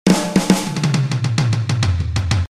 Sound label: drums